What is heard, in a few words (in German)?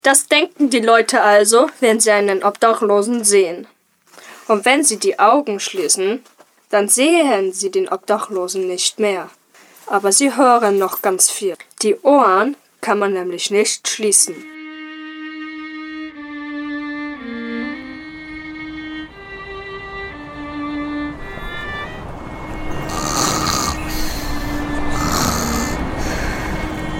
Obdachlosigkeit Geraeusche und Gedanken